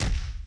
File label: MOVE; C64 Dreams; Multi-Sound Default